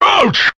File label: ouch; Voice